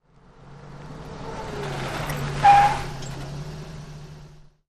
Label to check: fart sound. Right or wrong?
Wrong